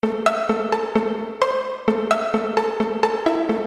techno synth, bass loopes; lmms

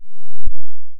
smooth inv saw, shapes; lmms

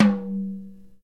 tom; lmms; drums

